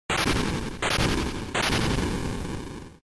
SNES RPG Defeat

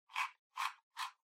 Portable Radio Tuning Dial

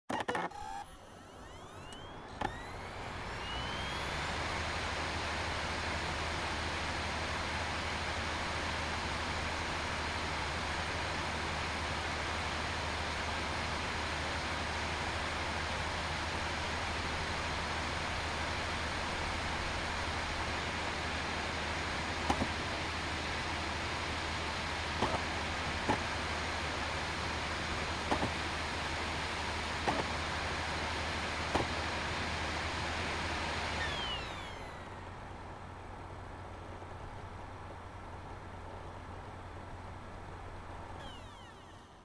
Optical Disk Drive Operating Noise

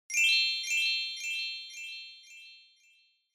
Bell Accent Delay

